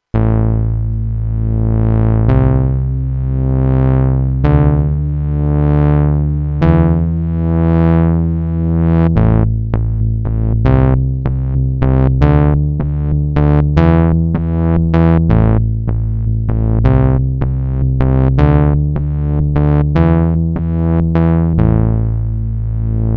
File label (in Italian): Suono generato con X LAB; Sonicware; Liven XFM